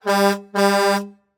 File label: Semi Truck Horn Honk; Large Vehicles; Blastwave